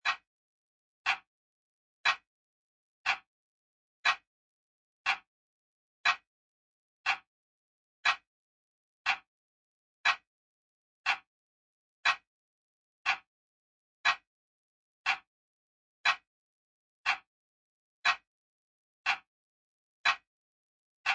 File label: Grandfather Clock Tick Tock; Lynne Music